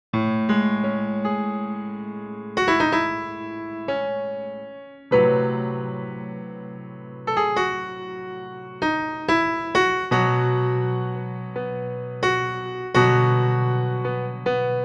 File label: piano